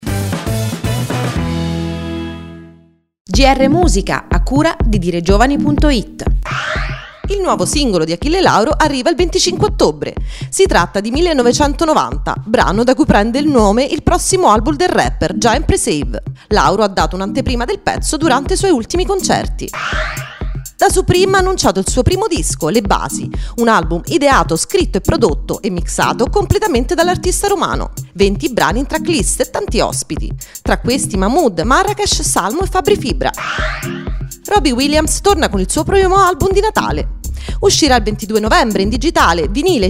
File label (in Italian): musica